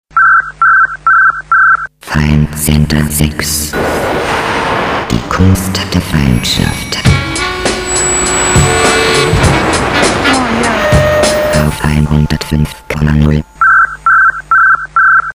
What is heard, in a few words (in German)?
jingle kurz